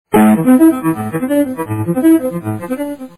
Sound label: arpegios, ebow